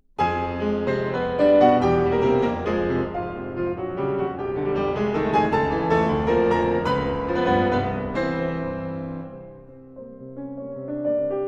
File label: Carnaval for piano; Estrella; Schumann